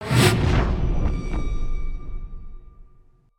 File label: Wisp Interact, ambience; ambience avant gardens